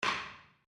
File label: Wood On Wood